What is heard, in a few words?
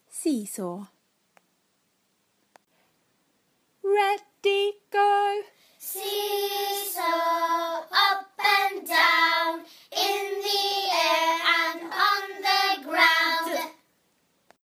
seesaw song; eyfs and key stage 1